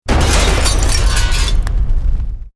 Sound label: EXPLOSION MEDIUM METAL GLASS; Created explosion effects, house, building or vehicle effects; Bombs